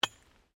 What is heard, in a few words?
Digging Pick Concrete; Construction; Various digging tools and processes